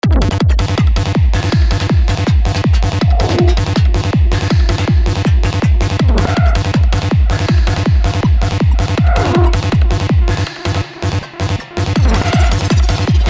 psy hard trance

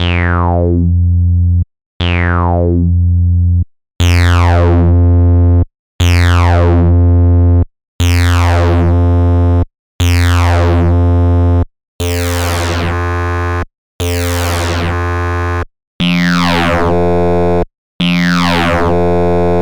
corrosion on resonant synth

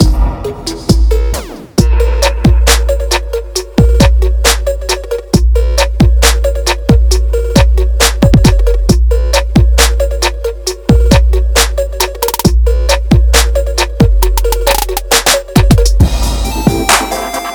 trap kick expansion; CAPSUN

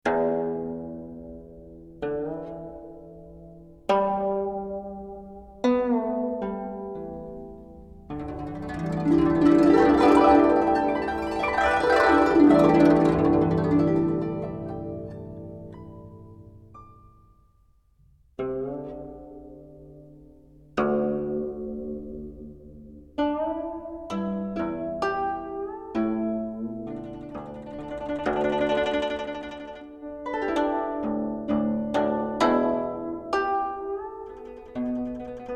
guzheng solo

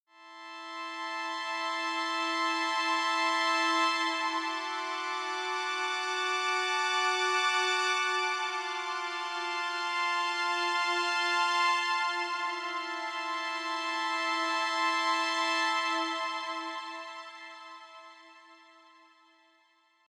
smoothy wavy strings

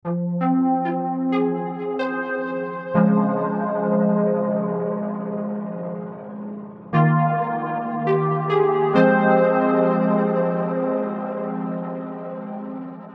keys

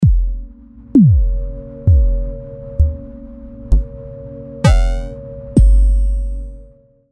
various kicks; moonrise

